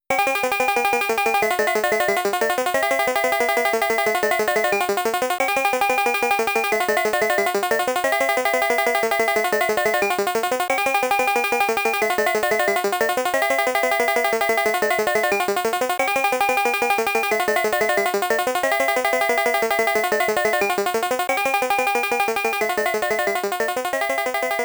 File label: Last One Music; Dig Dug